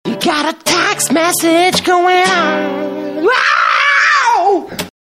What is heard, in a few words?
Text Message